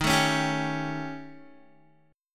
Eb 7th Flat 5th Eb down Guitar; Eb7b5 strummed; JGuitar

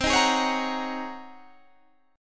G sharp 11th C

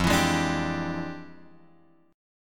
F Minor Major 13th, F; FmM13; {1 X 2 1 3 3} strummed

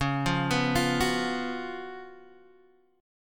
C sharp 11th C sharp, Guitar, Open G

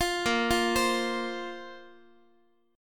Bb Suspended 2nd Bb Guitar; arpeggiated

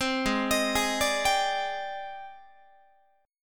G sharp Major Sharp 11th, Guitar; G#M#11; 15,16,18,18,18,18; arpeggiated

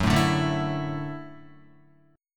Gb Minor 7th Sharp 5th, Guitar; strummed; JGuitar

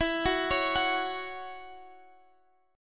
Db Minor Double Flat 5th E

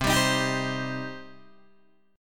C Minor C down Guitar DADGAD

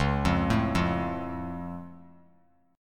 Db Augmented, down; arpeggiated; JGuitar

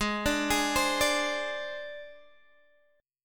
Ab Major Flat 5th Ab, Guitar Standard; arpeggiated; x,11,12,13,13,10

